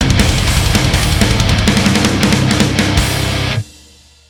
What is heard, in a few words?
outtro music; true admin